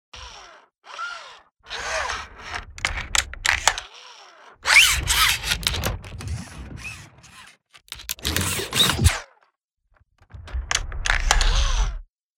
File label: ROBOT SMALL